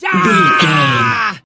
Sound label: voice male battle shout long